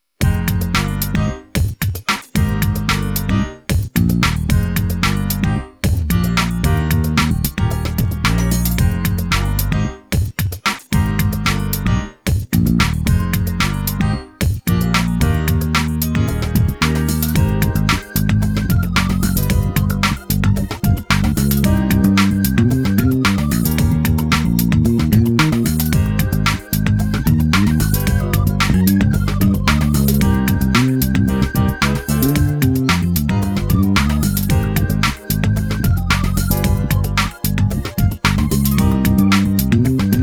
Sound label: gentle breeze piano only